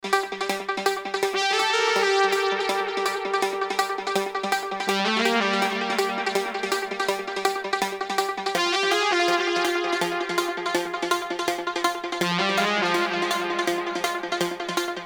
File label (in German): Arpeggio und Brasssound